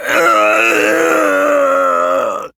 Death, Barks; Kuva Lich; Persona D

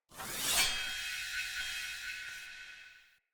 Energy Blade Summon Cast; Weapons; Swords

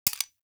Mech, Weapons; Warframe; Tenno; Dual Soma

